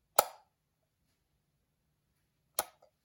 vykl sveta

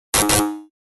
otvet ne vernyi

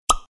probka

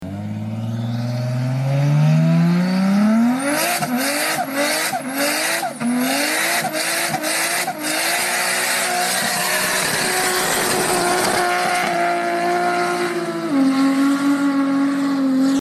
zvuk turbiny avto

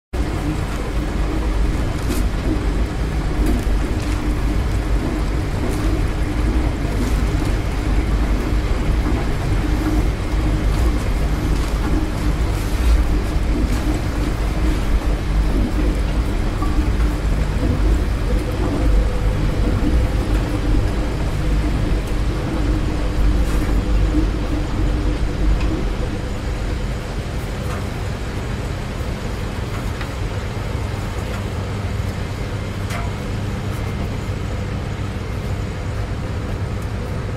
zvuk prachechnoy

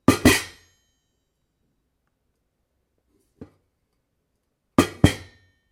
postavili kastr, zvuk kastruli

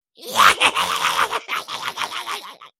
smeh goblina

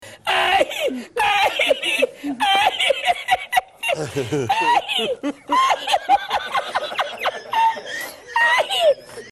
smeh

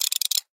prokrutka slaida

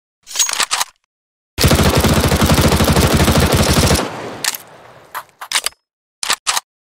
mp7, zvuki opuzhyia; csgo

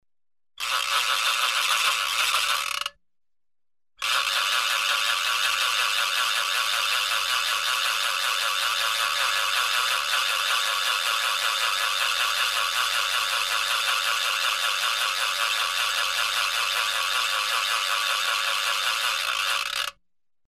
igrushka shumelka

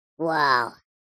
zvuk vau multyashnyi

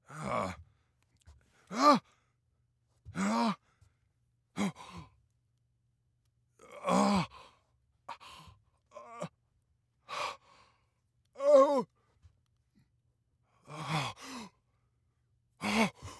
zvuk smerti

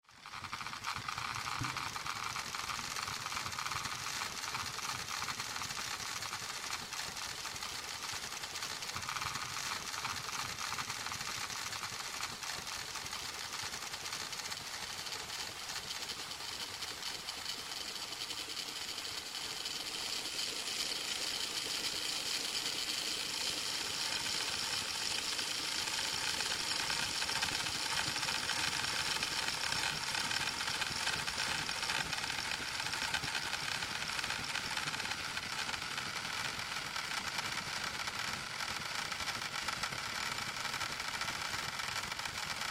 zvuki muravev

